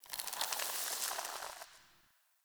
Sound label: Foliage Growth F; Powers; Fey Eldveil; Eldveil Stillness